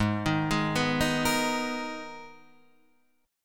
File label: G sharp Minor Add 9th G sharp; madd9:1,b3,(5),9